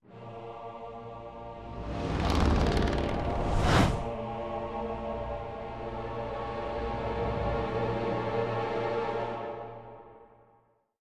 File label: harvest fail